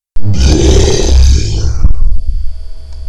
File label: Roar